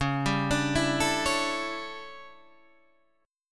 D Major 7th Suspended 2nd Suspended 4th C sharp, chord